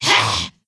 Attack; Tenebron

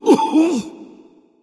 Roar Wound

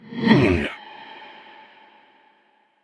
Wound; King Terenas

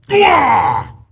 Attack Medium; Jaraxxus

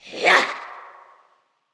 Creature Attack Light; Fjola

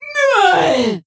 Wound Crit; Tenebron

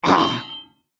Wound; Creature; Stormcaller Brundir

